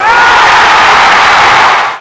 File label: big wow; WhiteOut; XBOX; trojan